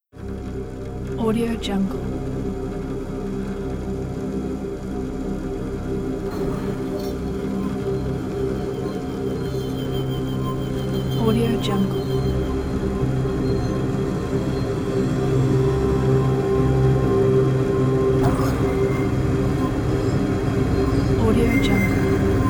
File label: haunting mysterious suspense drone